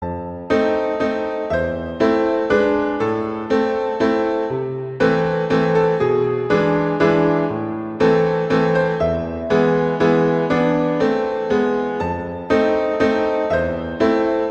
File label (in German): Walzer; Schumann; Albumblatter